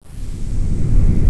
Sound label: Rumble; Death Blade